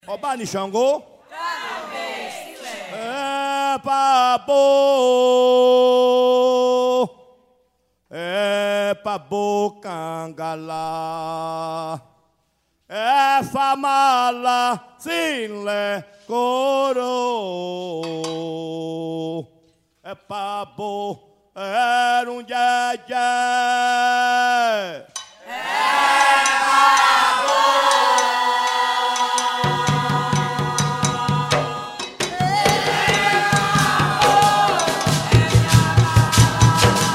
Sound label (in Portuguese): toada para xango